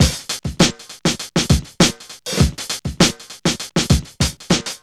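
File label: 100 bpm, Funky loops; Zero-G; Funky Elements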